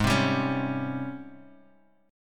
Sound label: G sharp Major 11th, Guitar, Drop D; 6,3,5,6,x,x